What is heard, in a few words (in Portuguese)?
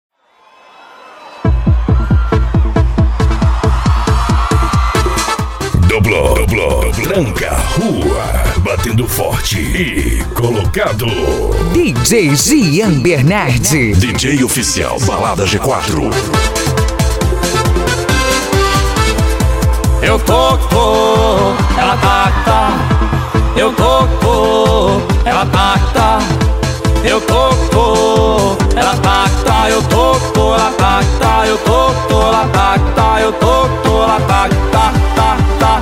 eletro funk